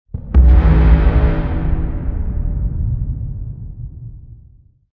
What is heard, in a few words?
Defeat; dust and salt